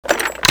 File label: pkm clip in